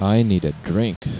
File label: DRINK